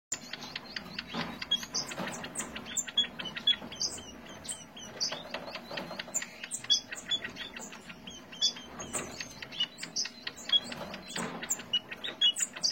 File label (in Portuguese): canto do capuchinho de cabeca branca